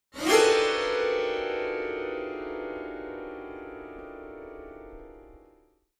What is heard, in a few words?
Grn Piano Strings Gli, piano